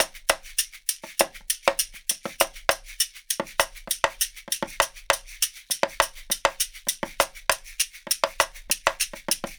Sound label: 100 perc loop ride; ride city